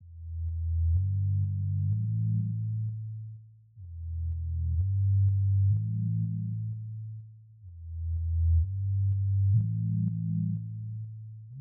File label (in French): basse